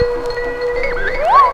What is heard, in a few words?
Vibes Fx; Zero-G Jungle Warfare 1, 2 & 3; 90 s Sample CDs